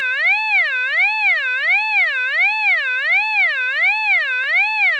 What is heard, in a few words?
tone sinus